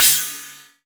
CHINESE PRC, PERCUSSION; AKAI S6000 CD-ROM - Volume 1

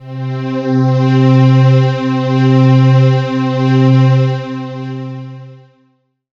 pad, Dance Synths Break Pads

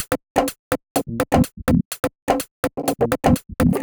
Top C# 125bpm, House Drumloops; 90 s Sample CDs; Zero-G House Fabrik Drumloops; ZG Drumloops

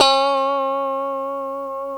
D 4 FUNK POP, STRAT, Guitars; Spectrasonics; Hans Zimmer Guitars VOL-1

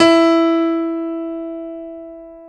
PNO E; Back In Time Records; Korg Universe VOL-1

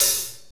HAT, Roland; Northstar - Drumscapes Roland

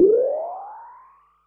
Perc, Percussion and FX; Pearl SC-40

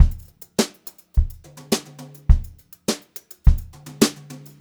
104, Brushed Drum Loops; USB Soundscan vol.39; AKAI

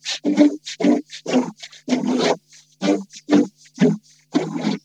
HOGCODER, Funk Guitar; 90 s Sample CDs; Zero G

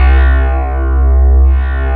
BASS C2, Synth Basses; Techno Trance

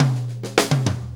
4-4 Fill, Drum Loops

